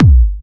Trance Kicks, Bassdrums

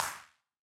Wider Clap

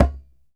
DJEMBE, African Drum

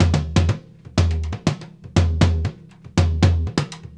TOM FEEL, BRZIL 120; Sampleheads; New York City Drumworks VOL-1

